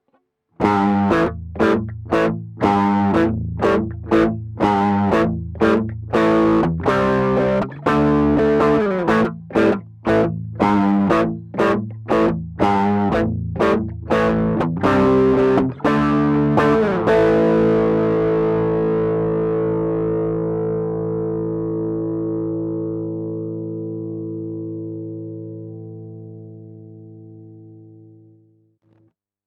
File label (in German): Ibanez TS-808 G12 T12 aktive pickups